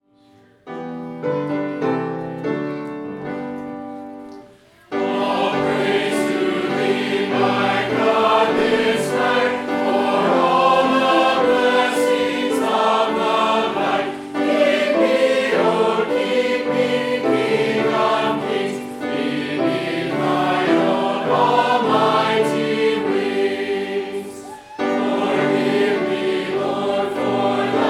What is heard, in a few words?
Tallis Canon All Praise to Thee